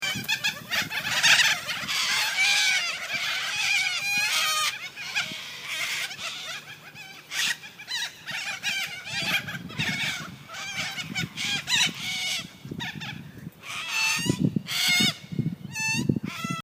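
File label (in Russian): kakadu golos, cockatoo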